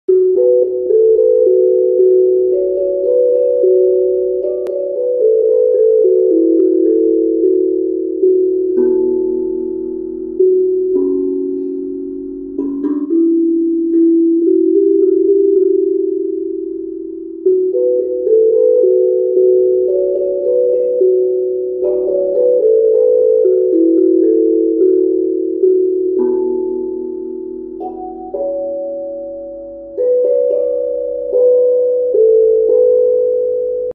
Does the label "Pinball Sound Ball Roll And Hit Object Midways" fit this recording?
No